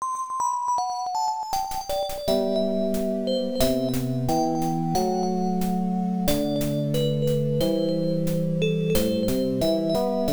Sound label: Again (Game Over); Aleste; MSX2